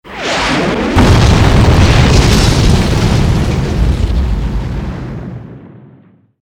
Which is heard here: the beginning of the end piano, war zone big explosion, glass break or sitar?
war zone big explosion